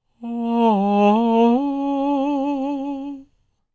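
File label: falsetto, Vocal Planet Jazz FX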